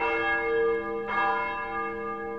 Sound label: bell loop; ambient; AssaultCube; Alien Stars; American Muscle Series